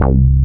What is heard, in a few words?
VX BASS C2, FUNK